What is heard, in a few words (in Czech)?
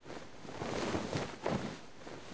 vytah prachy; Mafie 1